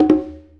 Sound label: Conga Two Hit; Essential Percussion